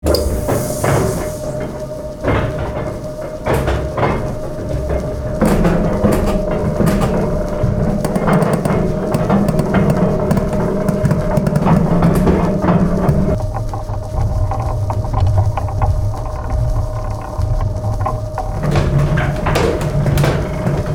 laundry mangles

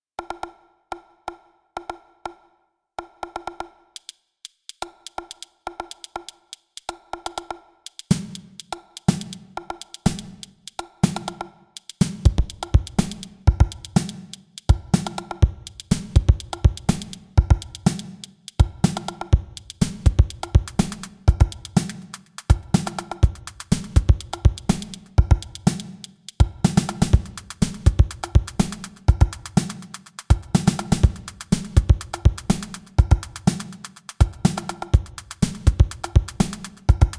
knock on wood kit

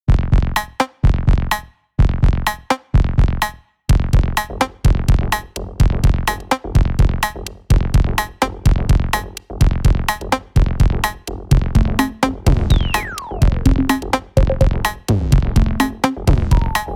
vco drums; vco drums vol1; hibiscus

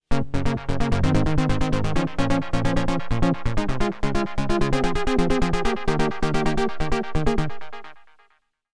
osc paraphonic

